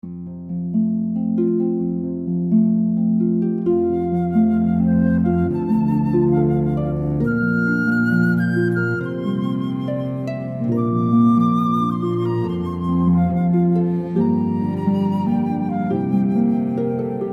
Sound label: harp flute cello